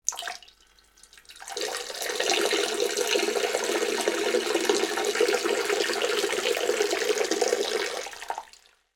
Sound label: urinating, household sounds